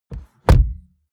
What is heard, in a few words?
car bmw e46 interior door rear close hard; Cars